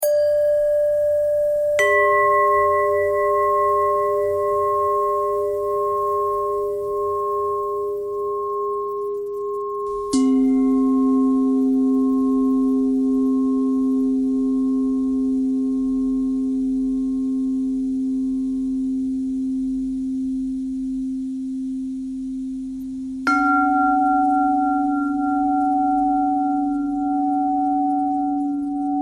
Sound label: bell thread pentatonic